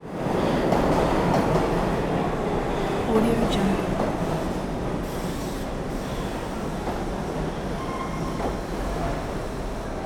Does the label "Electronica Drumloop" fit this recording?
No